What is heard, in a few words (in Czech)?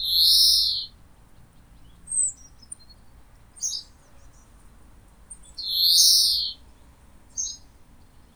Zvonek Zeleny